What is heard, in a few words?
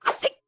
Bottle Swing; Swing a bottle (Recorded)